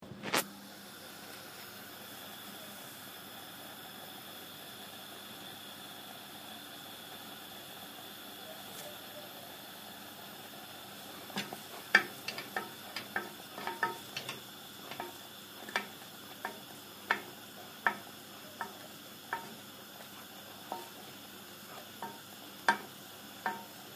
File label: Electrified Environment